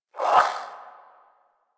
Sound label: Swing, Melee; Warframe; Weapons; Modular Melee